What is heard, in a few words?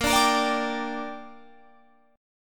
A sharp 6th, Guitar Open F; A#6; strummed; 22,21,22,21,22,21